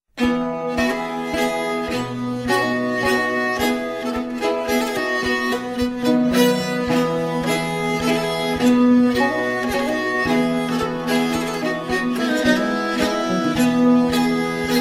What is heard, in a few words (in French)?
Polska, Suede